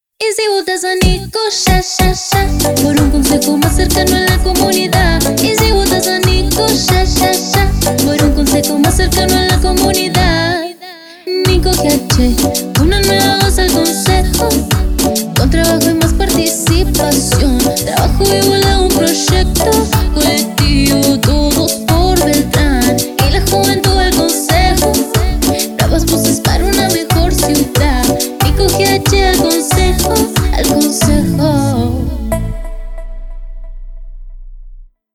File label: jingle